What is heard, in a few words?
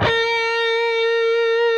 PRS lead